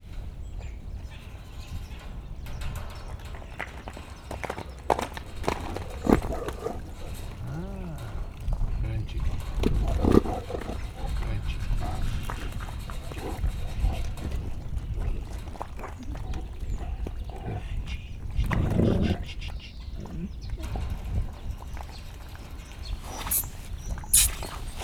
morog, szürke farkas; hortobágyi nemzeti park 2013

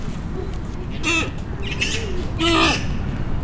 láma; Győri Zoo 2014

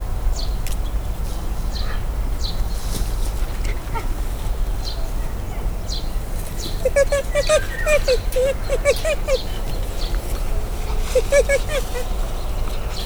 nyüszögés, aranysakál; szegedi zoo 2011

